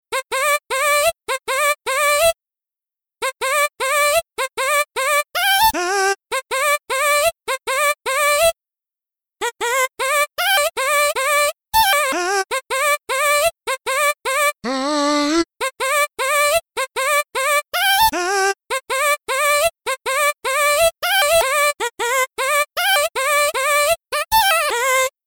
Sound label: Future Bass Melo Vo Chop Melo